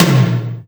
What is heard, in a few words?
Toms